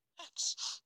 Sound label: sneezes